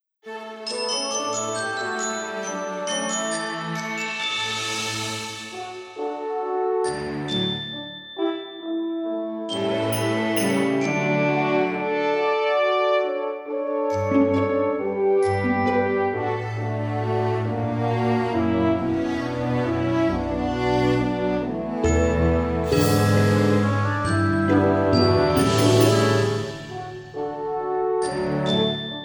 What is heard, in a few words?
Christmas Wish Waltz